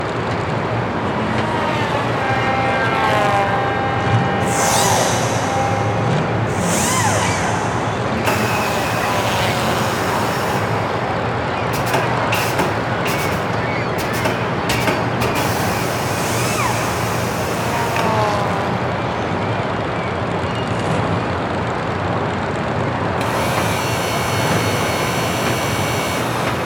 Ambient

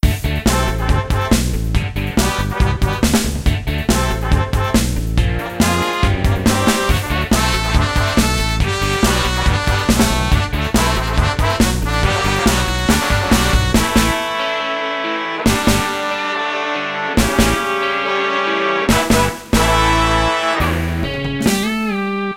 Bgm Loop Event loop

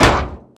Crash Medium; Test Drive Off-Road 3

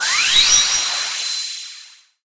Enemy Tower Charge

